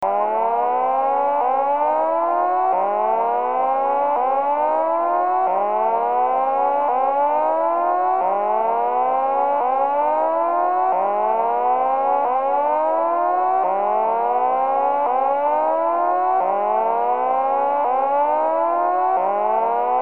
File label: boing loop